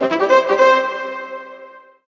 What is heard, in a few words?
mariachi in cup; Golf Blitz 1.12